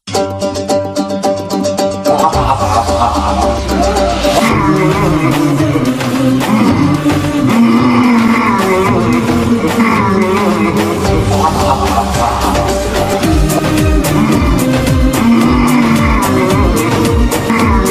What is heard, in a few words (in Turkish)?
dombira remix